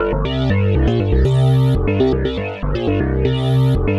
Hassh Bass, Bass Foundations; Sonic Foundry (Sony Creative Software); The Electronic Music Manuscript Collection

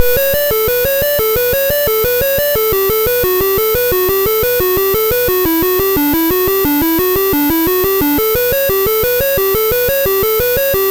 Apple II Synth, Chiptune; Sonic Foundry (Sony Creative Software)

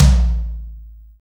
ZOOMTOM LO, NATUR.TOM; Drums In Your FACE!

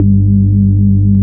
Synth Bass

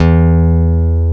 PICK E1; EL-GUT G

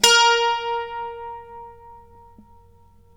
a3, Mandolin; Terkelsen

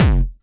hard bass drumz

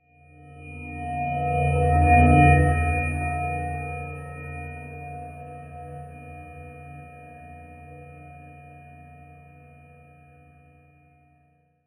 COMMUNICAT; FLYOBJECTS

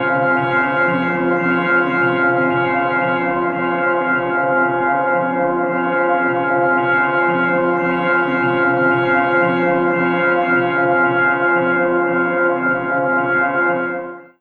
Soft Cascade CMin, Analogue; Zero-G Ambiosis

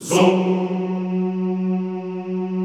ZOOMMM, Extended Classical Choir; Best Service

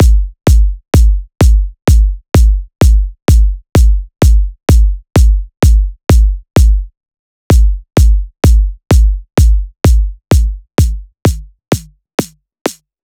Drum Loop BD; VEC5 Drumloops; VEC5 Multi Loops 128BPM; Loop Elements

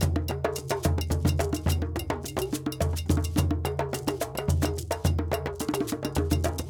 Djoun, World Percussion

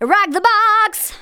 VOCALS SUNG; Techno Trance Essentials

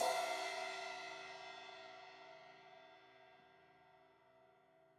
Crash 16in edge, Cymbals; Deeply Sampled Drums

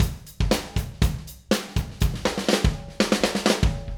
Acoustic Live Drum

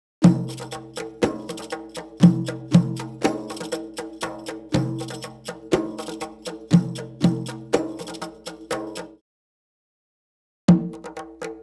Arabic Rhythms

